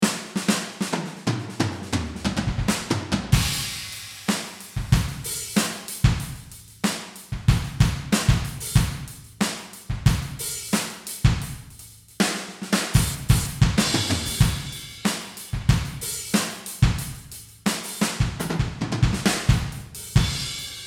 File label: Drums